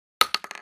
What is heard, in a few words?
12cal shell wood, shotgun; bullets